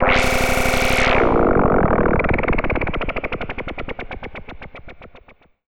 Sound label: FX Misc